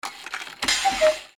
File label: clock cuckoo